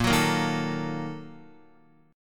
Bb-Major 7th Suspended 4th Sharp 5th, Guitar; strummed; JGuitar

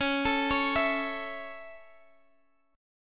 A Major Db

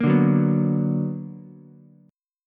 B Minor Major 7th, Ukulele; strummed; JGuitar

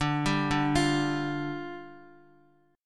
D Minor Double Flat 5th, down, Guitar; Dmbb5; arpeggiated; JGuitar

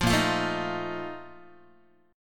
A sharp Major 7th Suspended 4th, Guitar; strummed

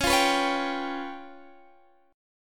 Db 7th Flat 5th, Guitar, Standard; Db7b5 strummed; JGuitar

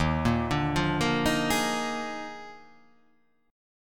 Eb Major 7th Suspended 2nd Suspended 4th Eb; arpeggiated; x,6,8,7,5,5,5,5; EbM7sus2sus4

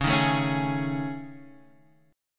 A Major 7th Flat 5th

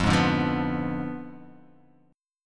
F Diminished 7th F down

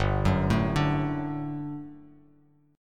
G Major 7th Suspended 2nd, Bass Standard; GM7sus2 arpeggiated; 3,9,7,7

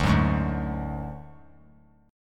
D Major Flat 5th D, chord; strummed; JGuitar

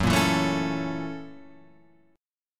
Gb 7th Sharp 9th Gb, Guitar Open F; strummed; Gb7#9; JGuitar

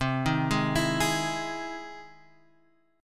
E Augmented Major 7th C

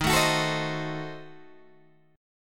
D sharp Diminished 7th D sharp, Guitar, Open G

